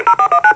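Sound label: push-to-talk DTMF unit ID, radio effect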